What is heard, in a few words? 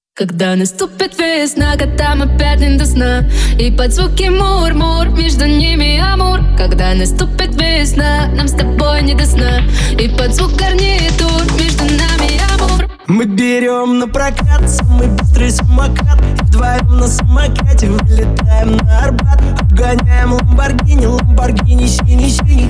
Techno Remix